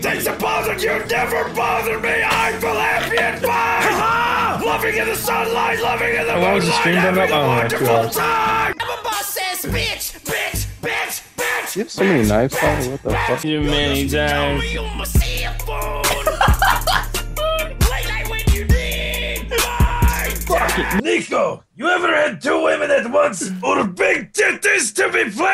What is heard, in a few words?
Singing